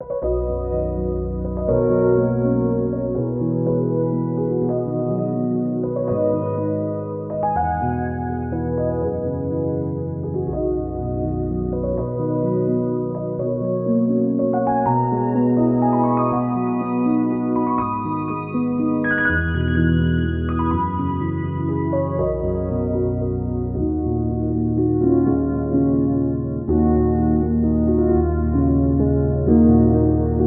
CP80; DayBreak

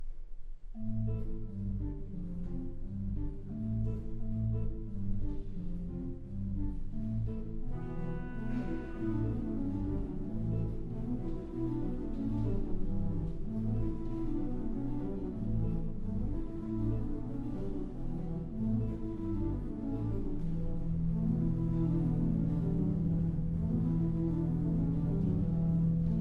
organ tubular bells with 32 accentuation; St SERNIN organ 2017; Y.RECHSTEINER-V.MONIN

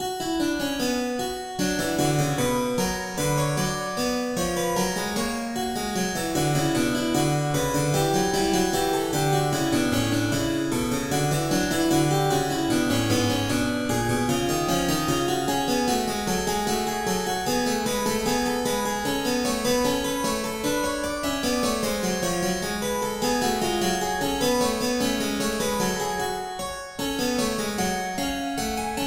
invention

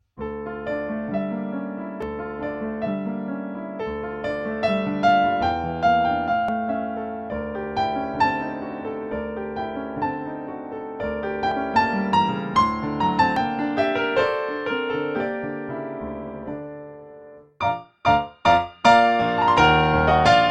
White Grand

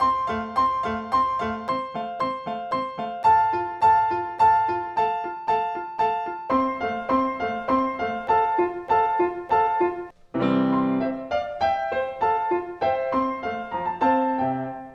Steinway D; VSL synchron PTQ641 Steinway D; Real Steinway D

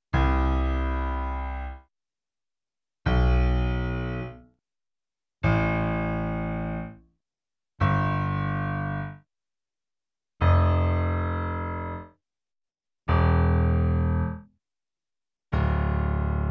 twang

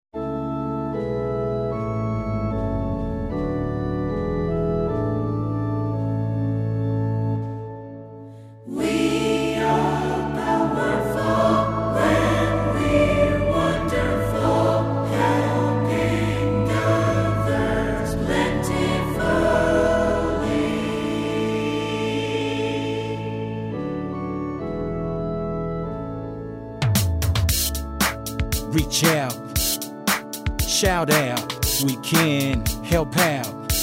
Choral Organ

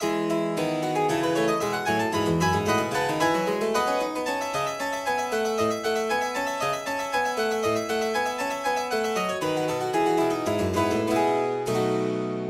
Closed Lid Live Wide Stereo Loud Ruckers

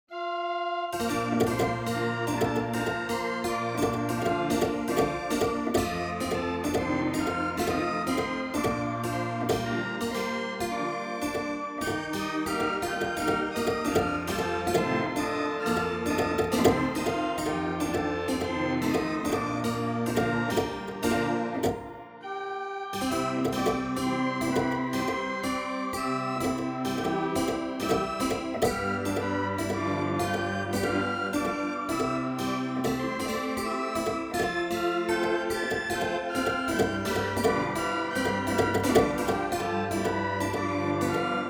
organ music

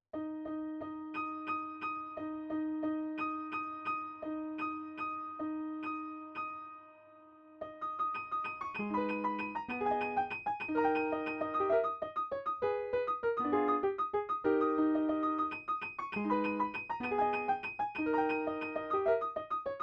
la campanella

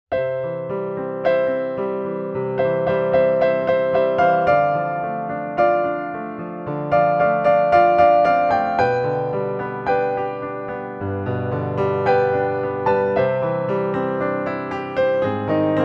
Contenporary NY Steinway D Modified

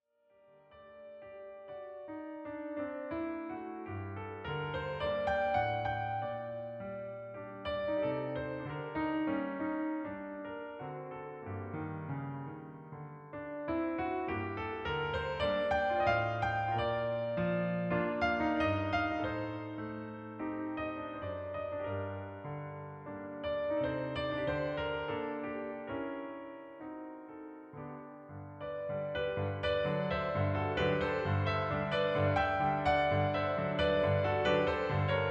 Yamaha CP4 CFX Barcarolle

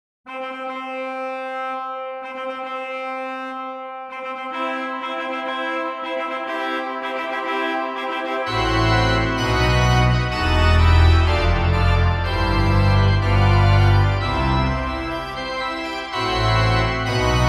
Wedding March Mendelssohn, Pianoteq; F Mendelssohn, from a Midsummer Nights Dream; Organteq; Modartt

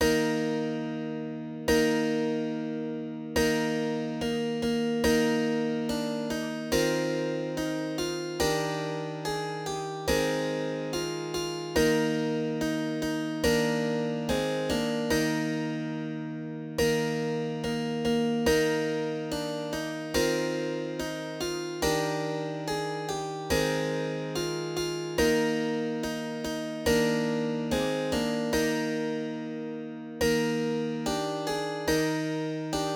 Giusti 392 Werckmeister III

